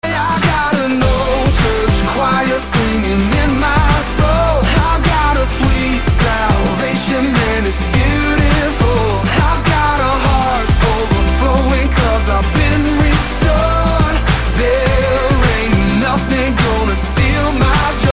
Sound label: old church choir ringtone